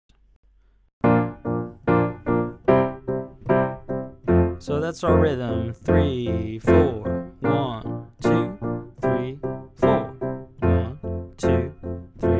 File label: Fills Licks Riffs 8ths, piano